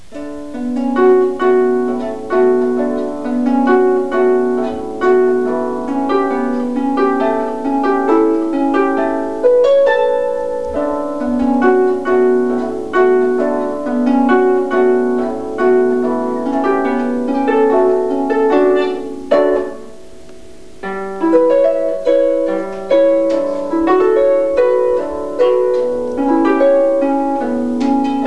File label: harp